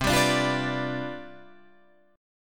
C Major 7th Suspended 2nd Suspended 4th, Guitar Drop D, chord